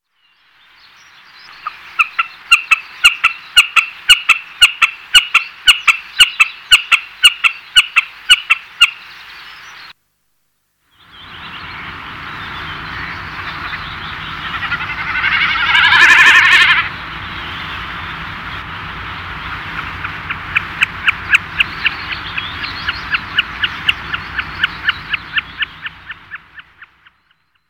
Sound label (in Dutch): watersnip